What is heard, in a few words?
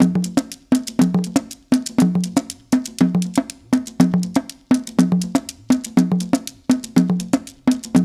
JU JU, African Contemporary